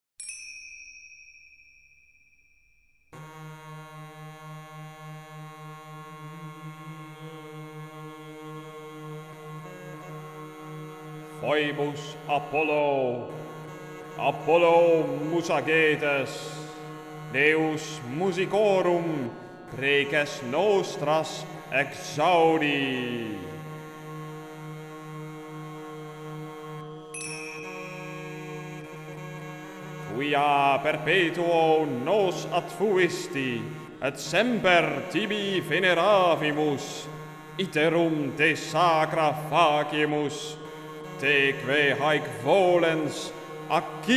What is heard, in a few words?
Folk